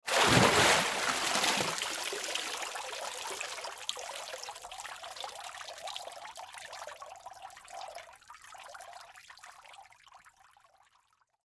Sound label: Drips Body Stand Up, Water Drips Body Stand Up Splash; Dripping; Blastwave